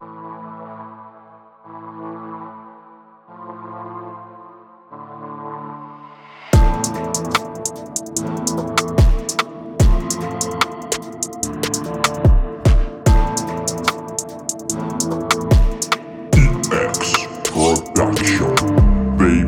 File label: beat